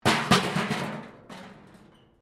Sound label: metal rubbish bin smash concrete; Metal (Sound Effects:Materials